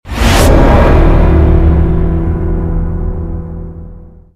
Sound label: stinger; tf; slender; prisoner